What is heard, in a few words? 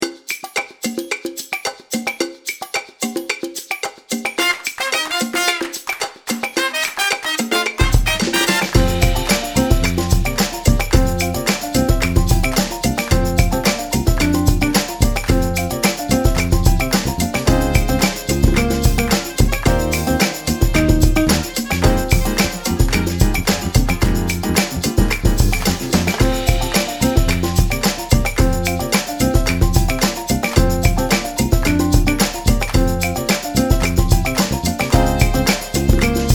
Latin Beginner, jazz